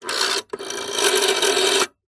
household telephone old fashioned rotary style recorded underside dial no seven